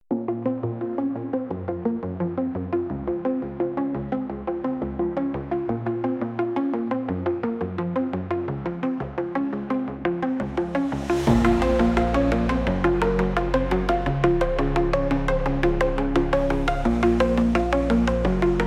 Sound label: background melody